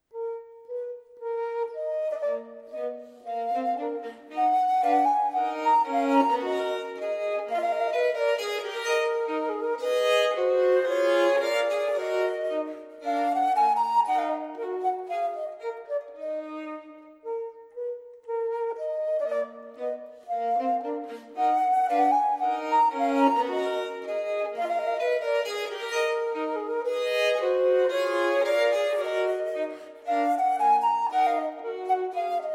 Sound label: Sonata 2 in E minor - Affettuoso, Flute and Violin; Joseph Bodin de Boismortier; Six Sonatas for Flute and Violin - Opus 51